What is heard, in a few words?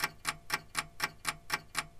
Grandfather clock; zps; Ambient